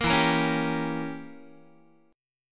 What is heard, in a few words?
E Diminished 7th E, Guitar; Edim7 strummed; JGuitar